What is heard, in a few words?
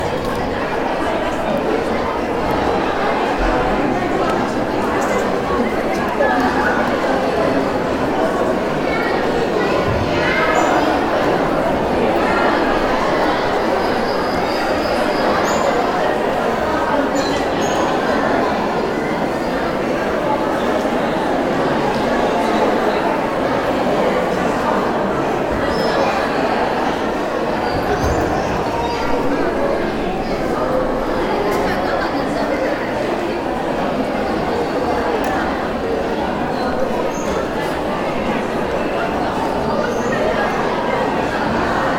festival in a hall